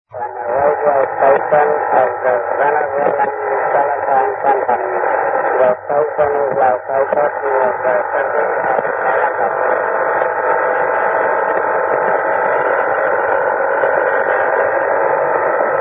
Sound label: usb